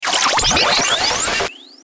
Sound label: Stinger Power Up; Stinger Power Up Warble Static; Production Element Imaging Element Accent Transition